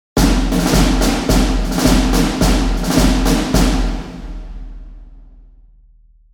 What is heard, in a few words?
Big Cinematic snare drum